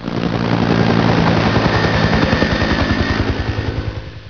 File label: helicopter